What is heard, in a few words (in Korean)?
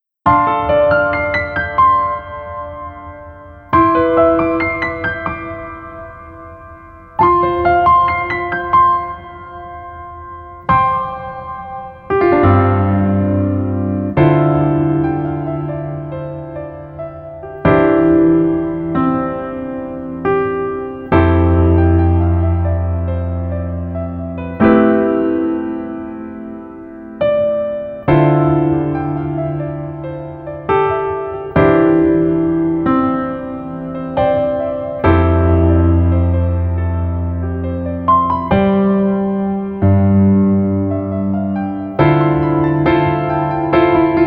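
Piano version 멜로디 MR 여자키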